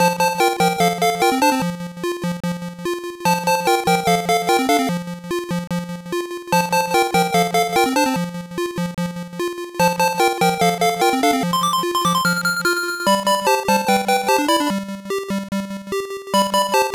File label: Minigame BGM; kikansha thomas ni notte pico gamerip 1995